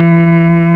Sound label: CELLO, MELLOTRON; East West - Global Sounds